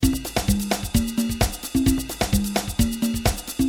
Afro Samba

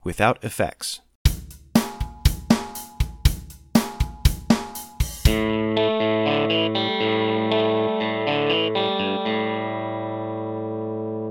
mid side stereophase filter plus; Mixcraft

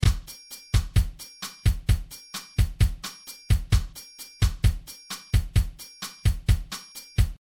Bossa Nova Theme

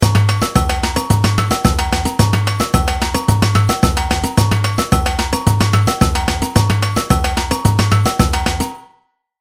Samba Batucada

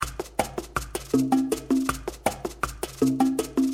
Salsa 5 4 Theme

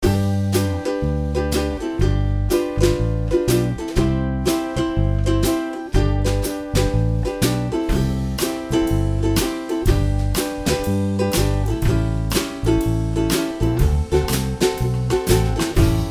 hawaiian; songkits; Mixcraft

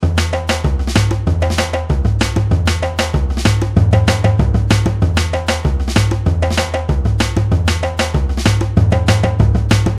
Samba Reggae

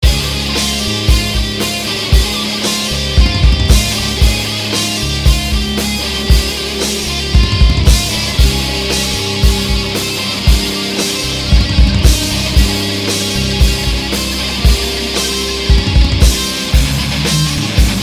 heavy rock; songkits; Mixcraft

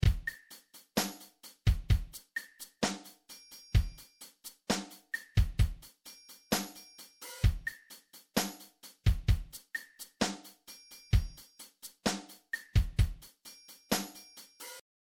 16th Soul Ballad

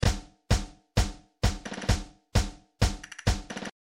Tango

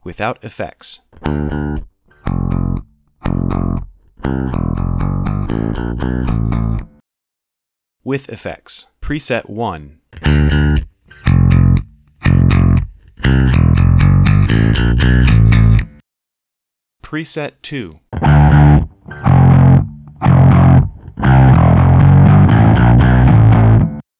virtual bass amp; effects; studio devil; Mixcraft

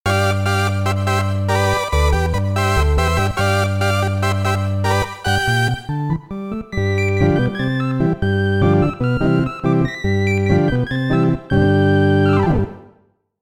combo organ model f; Mixcraft

